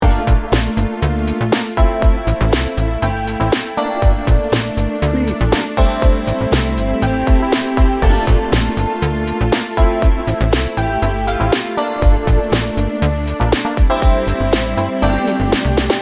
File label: smooth jazzy laid back loop